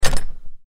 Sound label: Steel Door Unlocked; granny chapter two; android